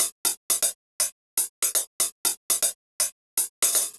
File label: Investigator Hats; Sonic Foundry (Sony Creative Software); Loop Noir; Session 08 - Psychic Investigator